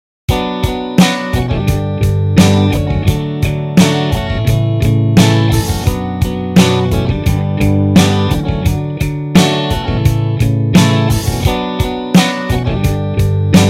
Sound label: The Indie Rock Strum Pattern; Strumming 101